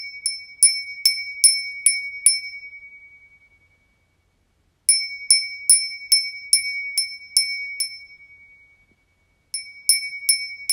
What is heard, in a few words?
windbell